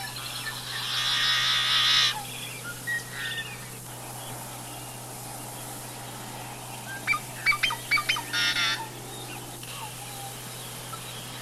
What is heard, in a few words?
blackbird YH